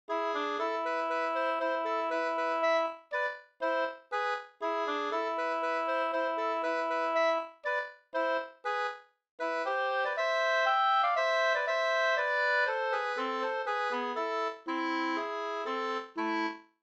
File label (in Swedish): folkdans